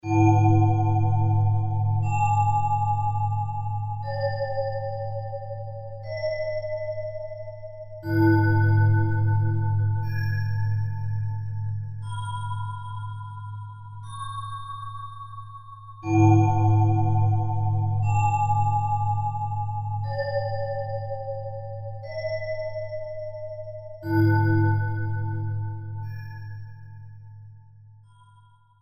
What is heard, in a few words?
grass harp